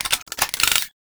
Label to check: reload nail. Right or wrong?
Right